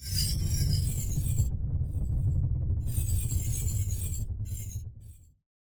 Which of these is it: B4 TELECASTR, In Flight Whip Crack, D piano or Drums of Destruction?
In Flight Whip Crack